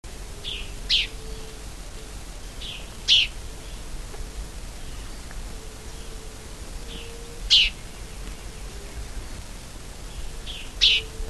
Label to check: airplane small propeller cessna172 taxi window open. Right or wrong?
Wrong